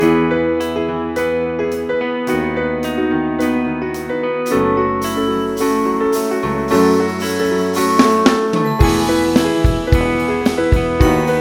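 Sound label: no acoustic guitar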